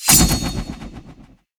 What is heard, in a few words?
bladesinger perfect parry